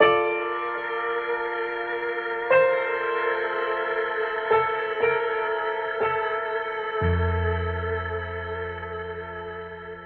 Sad sting; minecraft story mode gamerip 2016; A Block and a Hard Place

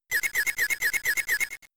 Status Confused; Pokemon; Gen 3; attack moves; RSE

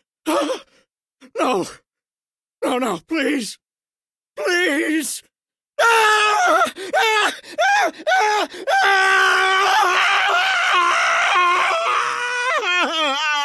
Extra Screams

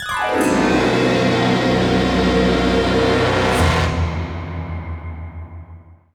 Goal Fanfare; Deep Sea Driving - 4th8th Place Goal Fanfare; crash bandicoot series sound box

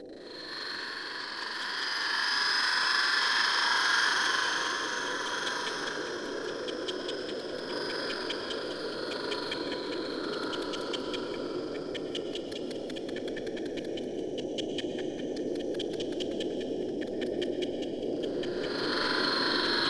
swamp - whisper; Kings Quest 8 Mask of Eternity

